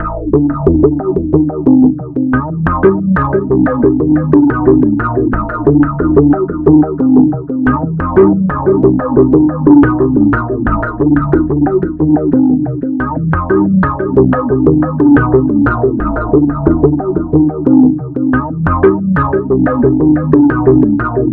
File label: acid wall 90